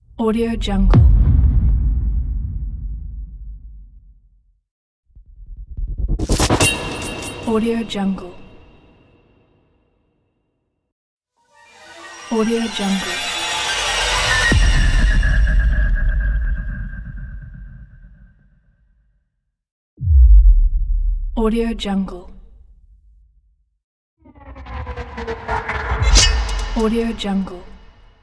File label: trailer video hybrid hit